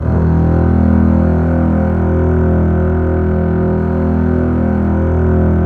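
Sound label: Bass Legato E1; Multisamples; Digital Sound Factory Virtuoso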